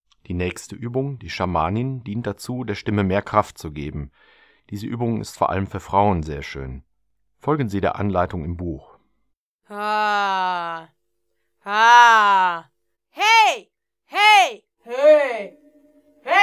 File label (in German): Schamanin; Buch Oberton Singen CD